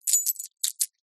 board game tokens metal handful movement hand; monopoly

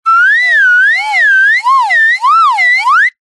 cartoon slide whistle ascend climb wobble

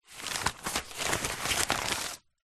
foley paper sheets many pick up from floor; zapsplat

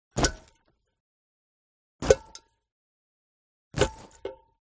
FOLEY CLOTH Leather Metal Bodydrop; smartsound

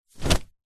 foley clothes throw into suitcase; zapsplat

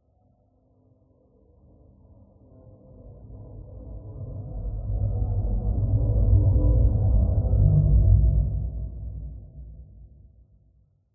Transition Liftoff; PMSFX

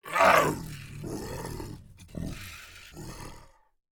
MONSTER Yeti grunt

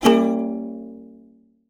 ukulele untuned discordant strum; musical; zapsplat

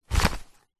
paper glossy thick pick up; foley; zapsplat

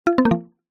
multimedia alert generic mallet wood

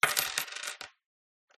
Coins Set Down On Wood; PMSFX

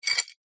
foley medal metal pick up from carpet; zapsplat

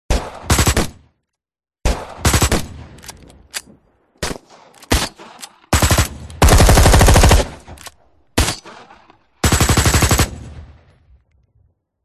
guns shooting machine guns reload; audio mangler

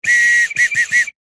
whistle medium into 3 short toots; foley; zapsplat

